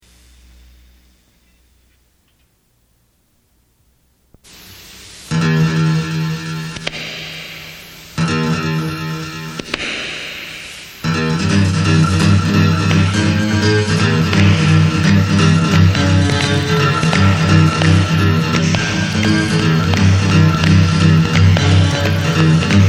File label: Accu Slap